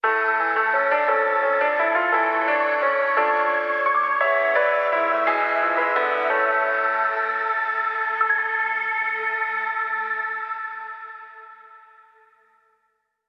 bgm game over; blazblue clone phantasma; 3ds

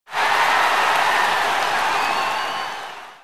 result scream

